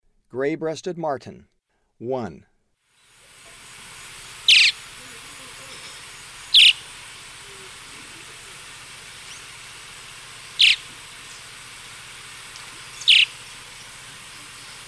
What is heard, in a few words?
Gray-breasted Martin; S. America; Eastern Lowlands